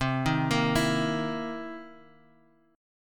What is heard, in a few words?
C Minor 7th Flat 11th, C, chord; arpeggiated; Cm7b11